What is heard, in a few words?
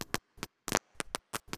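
spark burst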